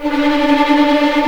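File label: D4 tremolo violins, left; East West; The Ultimate String Collection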